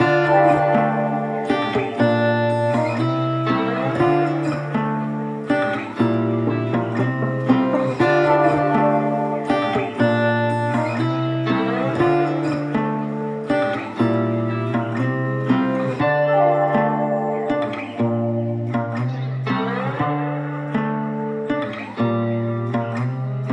Journey, 120 BPM D Min, Guitar Loops; Cymatics

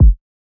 Kick - G#; Drum One Shots; Kicks; Cymatics; Eternity Sample Pack

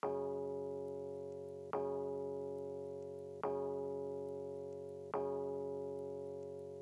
Rhodes, 141 BPM F# Min; Loop Stems; Cymatics Gems Vol 7; Gems Free Teaser Pack; Jump Up

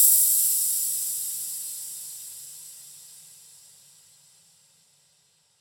Hat Crash; Psytrance; FX

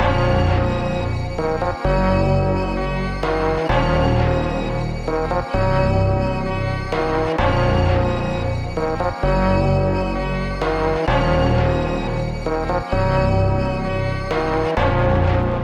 130 BPM A# Min, Melody, Trap; Cymatics; 2022 Melody Collection; Trap Pt 1

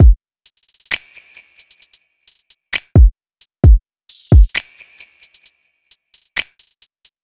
Drum Loop 132 BPM; Vapor; Cymatics; Vibes II Drum Loop Collection

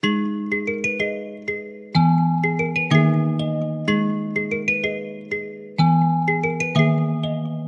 Melody Loop 125 BPM G# Min Bells; Melodies; Loop Stems; Cymatics; Oracle Sample Pack; Oracle Various Melody Loop 3

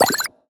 Retro FX; Cymatics; Video Game Sample Pack Vol 1

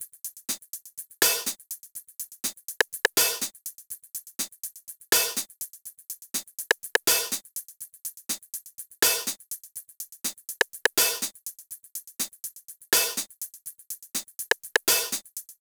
Percussion Loop - 123 BPM; Topdog; Cymatics; ANALOG EVOLUTION Beta

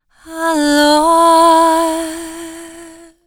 Vocal Lines - Am 70Bpm

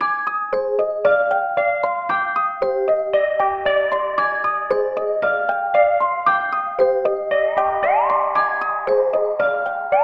115 BPM C Min Bells; Cymatics; Agony; PHARAOH

